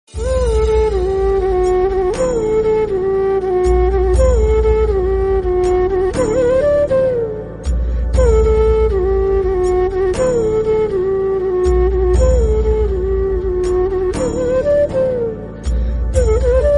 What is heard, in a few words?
instrumental music flute; relexingmusic